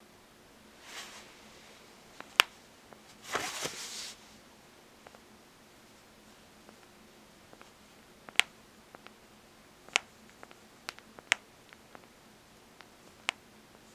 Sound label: Bone pops